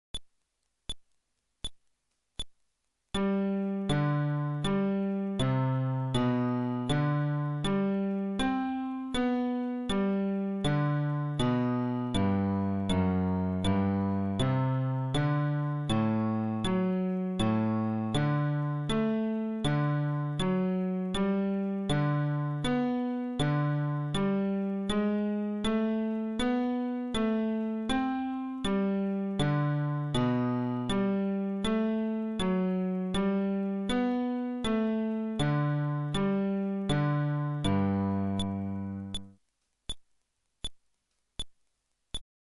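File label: Arpeggio テンポ80, フレットレス bass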